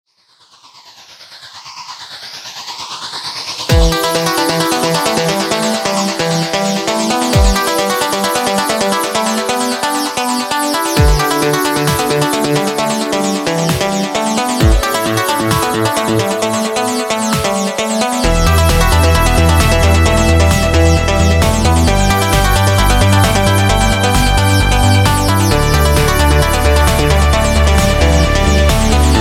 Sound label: instrumental; Space Bob vs. the Replicons